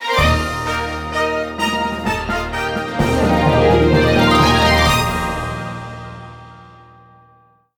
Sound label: victory; Rosalina